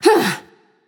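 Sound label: grunt attack, female; smite; voicepack; hera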